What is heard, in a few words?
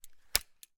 gun carl gustav m45 swedish k; still north media